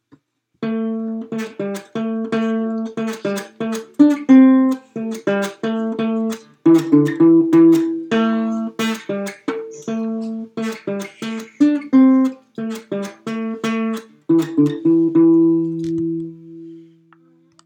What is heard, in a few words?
guitar